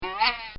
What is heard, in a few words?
Comical Sound Series Boing Spring; Funny Sounds